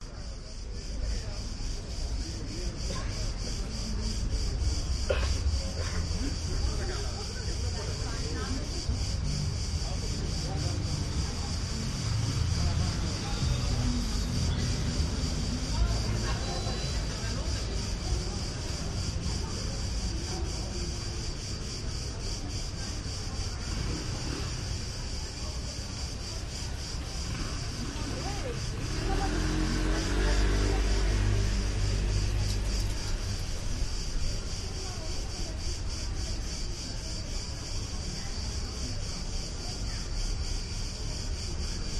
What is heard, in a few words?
athens side streets weekday evening; drakou; your free sounds